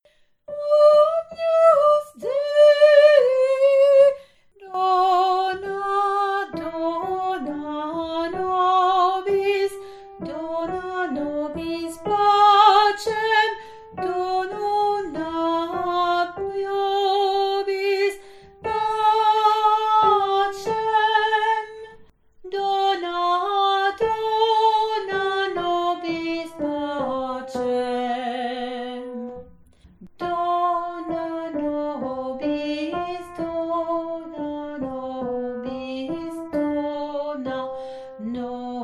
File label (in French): agnus dei voix 2